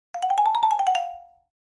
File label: Xylophone Fst Up Down, mallets xylophone